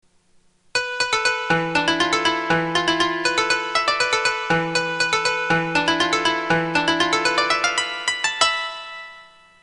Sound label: opening koto